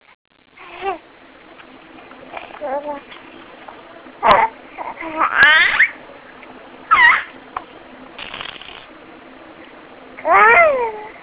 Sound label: Squealing; sqealing in delight